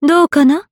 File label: Attack